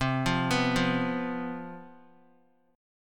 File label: C Major 7th Suspended 4th, down; arpeggiated